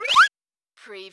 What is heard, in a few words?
CARTOON SLIDE WHISTLE WIND UP